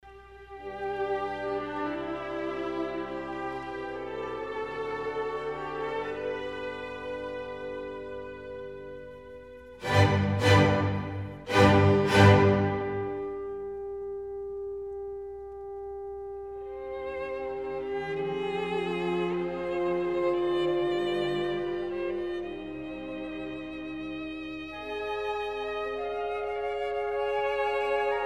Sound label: Scottish Fantasy for violin & orc; Kyung-Wha Chung; Bruch